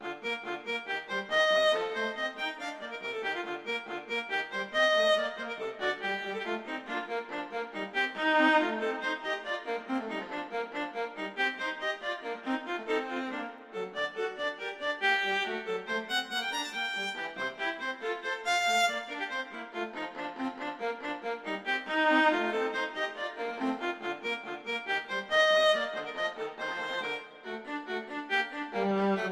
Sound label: Rondo, Allegretto; Mazas; Duets; Duet 6